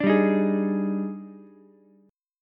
Eb Major 11th, Ukulele, C6; EbM11; strummed; JGuitar

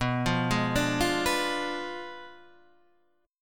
B Diminished 7th B down Guitar Standard; Bdim7 arpeggiated

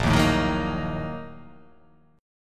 A sharp Major 7th Suspended 4th Sharp 5th

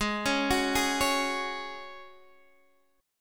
G sharp 7th Suspended 4th; arpeggiated; x,11,11,11,9,9; G#7sus4

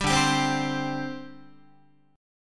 D 7th Suspended 4th, down, Guitar; strummed; JGuitar

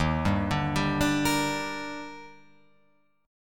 Eb Major 7th, Guitar, Open F; EbM7; arpeggiated; JGuitar

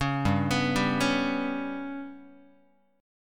G Major Flat 5th G down Guitar; GMb5 arpeggiated; JGuitar

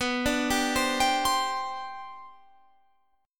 C Suspended 2nd, B, guitar chord; arpeggiated

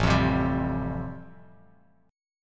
A-Minor 7th Sharp 5th, Bass Standard, chord; Am7#5 strummed; JGuitar

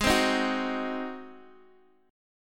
Ab 7th Ab down Guitar Open G

